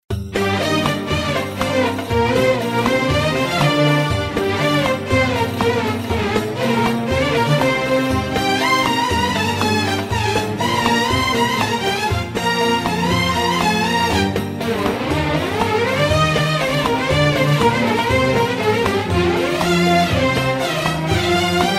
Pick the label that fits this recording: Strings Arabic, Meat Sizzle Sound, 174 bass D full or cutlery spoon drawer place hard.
Strings Arabic